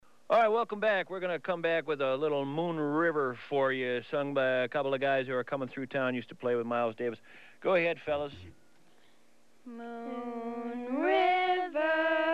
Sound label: sung by the boys